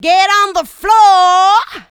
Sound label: Female Vocals; Houseworx